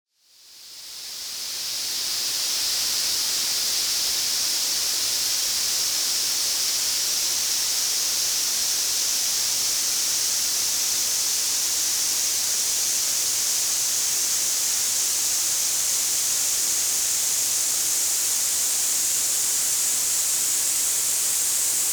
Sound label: sweeping tinnitus tranquilizer 6500hz; masking noise